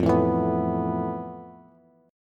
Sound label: F Major Sharp 11th, Bass, Bass Standard; strummed; JGuitar